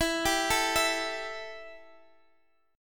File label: E Minor Double Flat 5th E Guitar Open D